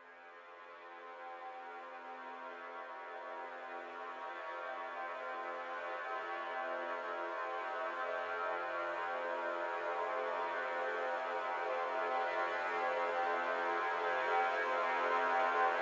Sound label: sacred chord